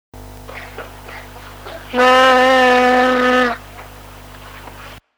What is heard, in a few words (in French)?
vache